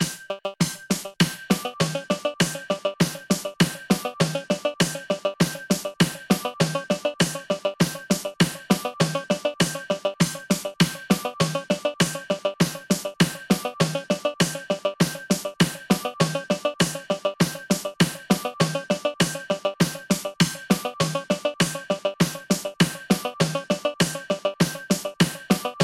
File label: town; reldens